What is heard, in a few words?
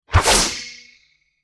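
Sword Slash Transition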